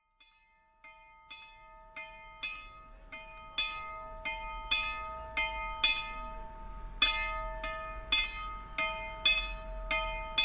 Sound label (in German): gesprungene Glocke